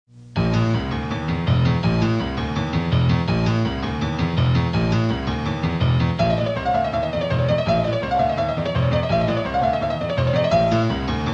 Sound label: piano